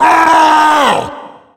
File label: tank yell; hl2dm; oppressive territory